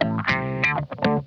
DIRTY OCT, Funk Guitar; Zero G - Funk Guitar

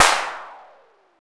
WHIP SNARE, ANALOG PERC; Zero-G Ambient 1

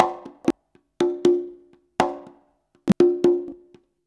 CONGA 119, Modern Percussion Loops

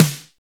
SNARE R8 FAT; Roland L-CDX-01; Snares 2; Sn Modules 2

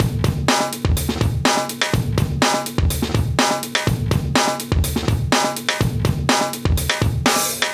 124 Beep Beep Bounce, breaks

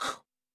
BEATBOX, Yamaha SY85 Drums

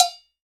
CHA CHA, Latin Hand Perc; E-MU Producer Series Vol. 2; More Studio Essentials; Composer

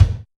RX8 BD, Yamaha RX-8

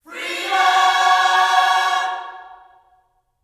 FREEDOM, Vocal Planet Gospel

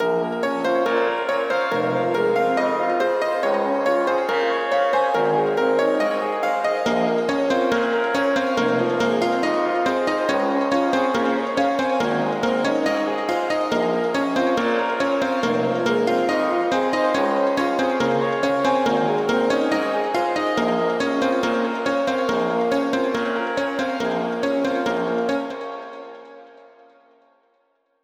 Break Instrumente Full Wet, 140 BPM; Vengeance - Essential Clubsounds Vol.5; Demo Constr Kits; Catch a Plane Kit; Root A#min; Synth

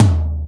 Yamaha CS6, Drums

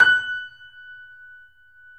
Yamaha C7, 3D Pianos; E-MU Producer Series Vol. 5

